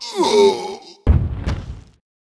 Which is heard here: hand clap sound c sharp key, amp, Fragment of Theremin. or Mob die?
Mob die